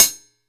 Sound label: MIX CHH, OLD HH; 14 OLD HH; AKAI S-Series CD-ROM Sound Library VOL-3